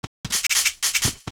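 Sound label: maracas; ui; stingers; frogling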